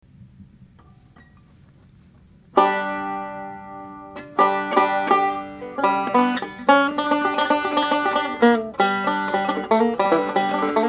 hard picking, banjo; golden era

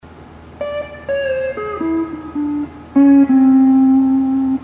hawaiian piece

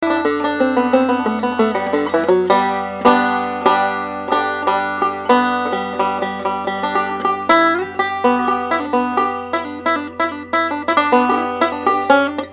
buzzing problem on string one, banjo; golden era

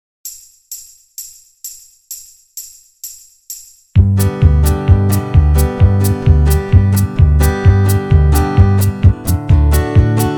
key of g, midi bass, banjo backing tracks

